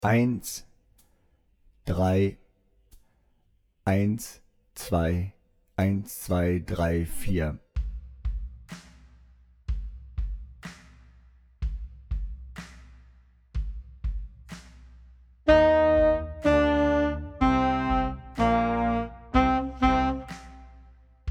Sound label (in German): Power Rock Tenorsaxophon lauter langsamer